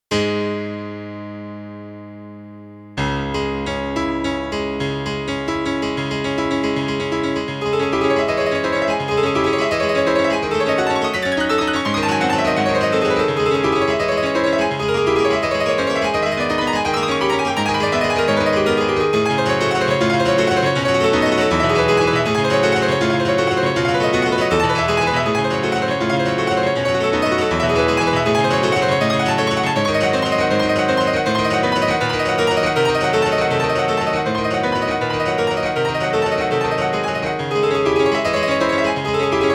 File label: Dynamic Piano; SQ-R Plus INT00